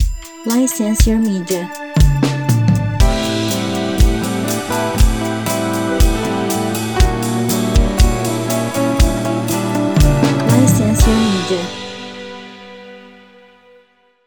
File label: Bossa